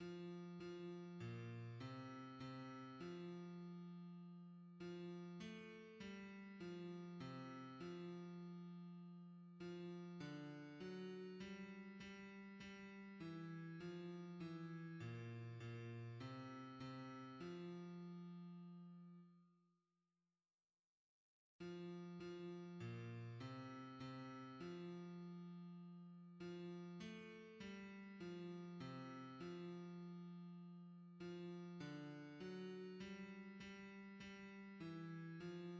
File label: hymns, bass